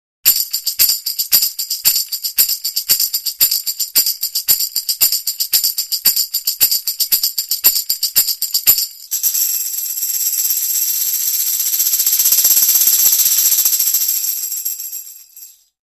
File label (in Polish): tamburyn